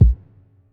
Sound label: low tone kick single shot a sharp key, Royality free kick one shot tuned to the A# note